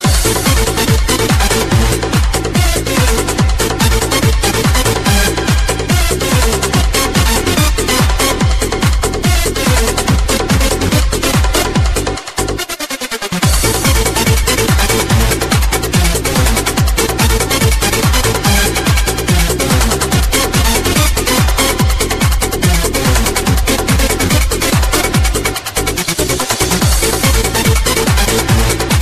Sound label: Groove